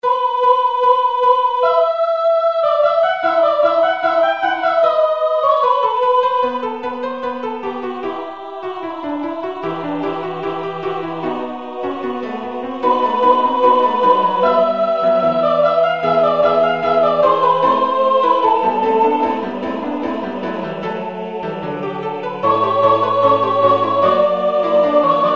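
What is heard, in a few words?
soprano; Vivaldi; Gloria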